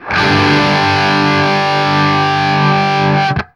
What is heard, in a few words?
open G, open chords, guitar; East West; Steve Stevens Guitar Samples Collection